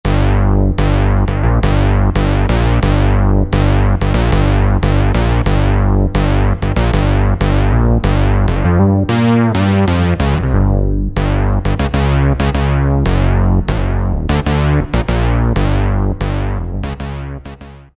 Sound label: OCTAVE BASS; Akai SG01v